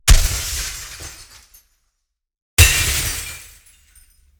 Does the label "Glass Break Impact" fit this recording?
Yes